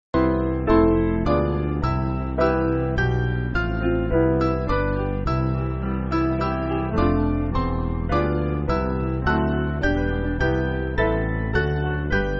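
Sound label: Piano, Music Backing; Father All Loving; Was Lebet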